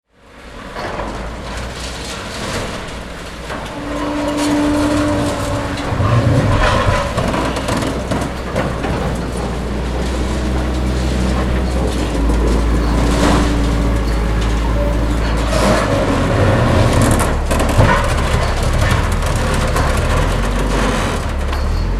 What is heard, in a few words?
Bulldozer demolition debris impacts